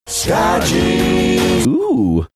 ooooh

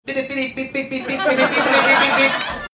making teletype sounds

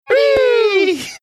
making fanfare sounds

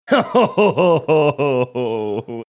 groaning laughter

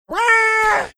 making energetic kid sounds

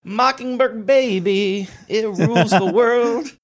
singing mockingbird rules the world

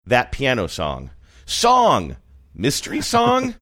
piano song

